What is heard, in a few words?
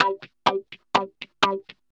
125 g, guitar licks; Vocal House; PS-28 Vocal House Samples; instrumentloops